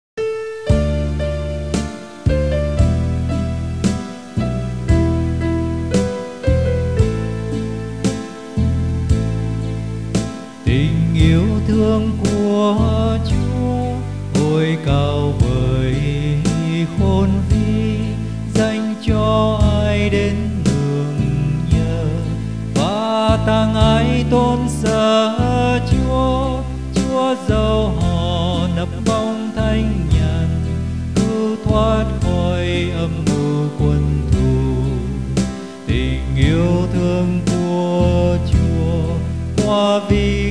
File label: bass